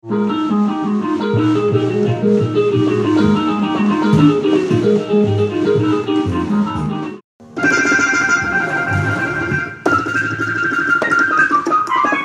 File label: piano; improv: E.Piano